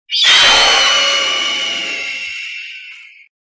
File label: diamond dust